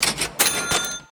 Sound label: cash heal; ui; HEDZReloaded